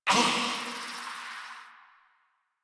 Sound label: Pain, Barks, Battle; Warframe; Zealoid Prelate